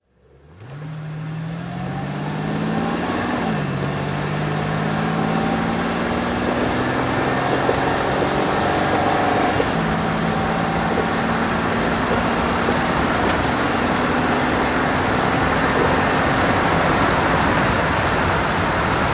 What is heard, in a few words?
etype v12 conv drive